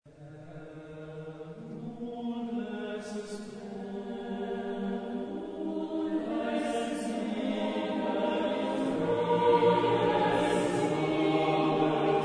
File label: Motets, chorus; Mendelssohn; 3 Motets Op69; Herr nun lassest du deinen Diener (Nunc Dimittis)